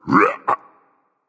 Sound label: grunt jump berserk; cuchulainn